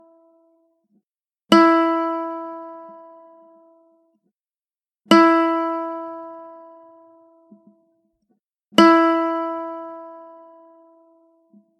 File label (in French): accordage E, ukulele